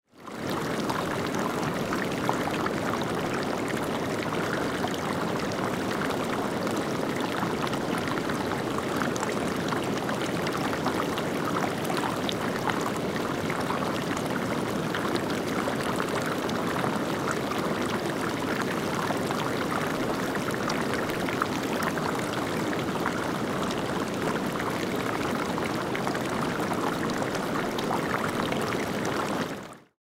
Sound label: Creek recording; LV Creek